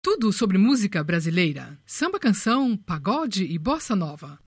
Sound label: Jingles; madeinbrazil